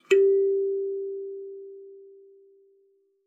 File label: KALIMBA G4; Xsample VOL-7